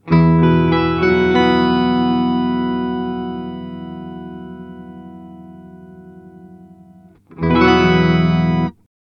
Mooer Tender Octave X2 sub und upper Octave mit direct signal